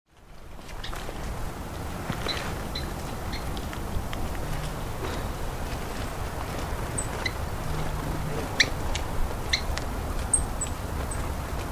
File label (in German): bartlaubsaenger